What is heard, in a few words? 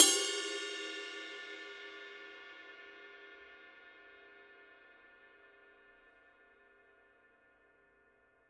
formula 602 22 med ride bell; paiste; classic sounds